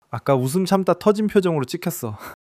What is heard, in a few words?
laughter